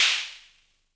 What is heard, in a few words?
Snare, Univox SR-95